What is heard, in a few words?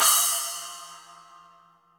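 Cymbal Finger Open, latin percussion; Drums; Drum Hits; Ableton; Factory Packs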